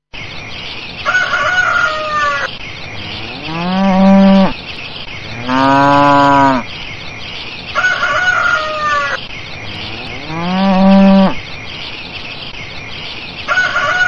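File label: rooster talks with cow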